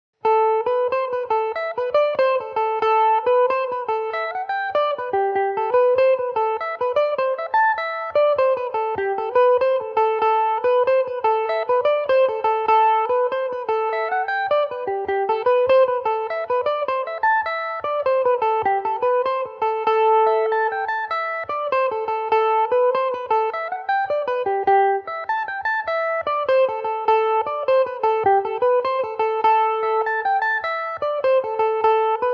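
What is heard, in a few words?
A Dorian, Irish mandolin; The Gallowglass; Epiphone Mandobird VIII electric mandolin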